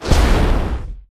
atlas dash close; Titanfall 2

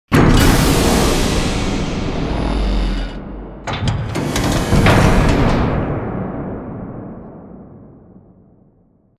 Giant Floor Panel Lower Rotate Mech; Boomtown; Sideways Town; Titanfall 2

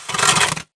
Stalker Damaged Footscrape Long Concrete; Titanfall 2

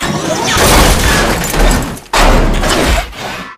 atlas melee pilot extract throw; Titanfall 2

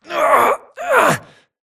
grunt Struggle; Titanfall 2

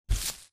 imp bullet lightballistic dirt debris

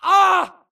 grunt death quick; Titanfall 2

